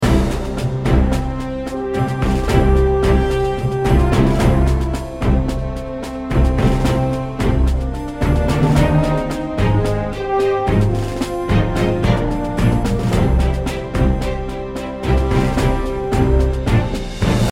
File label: BGM raid; dragon heroes shooter rpg; android game music